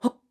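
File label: Climb, voice; Link; BOTW; Breath of the Wild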